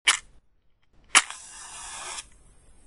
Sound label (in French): allumettes; victor pellet; tempete